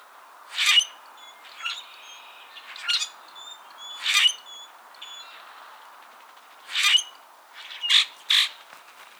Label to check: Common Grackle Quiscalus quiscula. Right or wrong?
Right